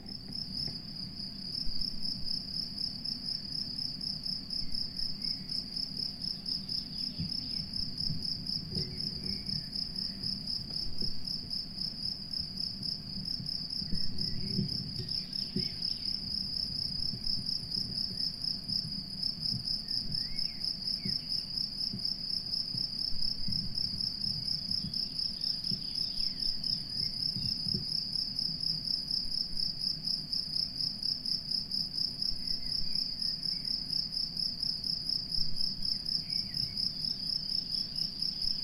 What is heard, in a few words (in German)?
Grillen Wiese